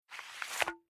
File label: Pulling Sliding Spanner Into Tool Set Plastic Sheath; Hand Tools